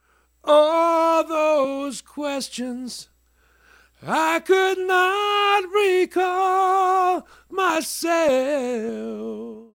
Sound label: studio vocal; conversion to digital